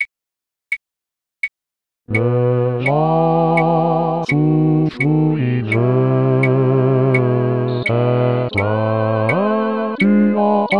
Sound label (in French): Bas